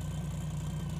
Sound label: engine idle; simpra